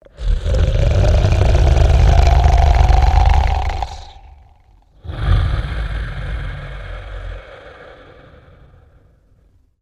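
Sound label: Character Giant Sleeping Snore; the unfinished swan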